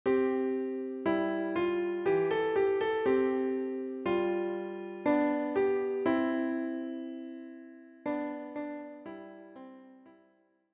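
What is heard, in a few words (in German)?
Klavier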